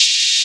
Open Hat, Cymbals; drumkits; fl studio; bvker drumkit

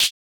clap; goodtakimu drumkit; fl studio; drumkits

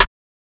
snare; maily plugnb; fl studio; drumkits

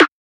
Snare

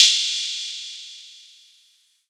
Ride, Cymbals; drumkits; fl studio; bvker drumkit

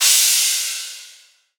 Crash; Cymbals

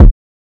kick; FL Studio; xander brown drumkit

